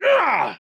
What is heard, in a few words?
man attack heavy; soundscrate